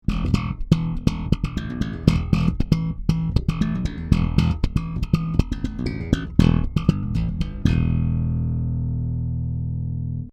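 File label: Warw Strea 2PU Pop, bass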